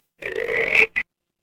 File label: animal frog